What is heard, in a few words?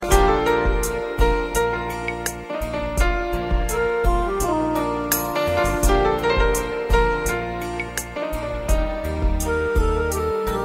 instr; classical